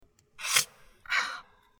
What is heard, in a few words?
slice